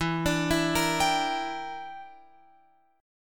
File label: E Minor 7th Flat 5th E, Guitar Open F; Em7b5; arpeggiated; x,11,14,11,13,14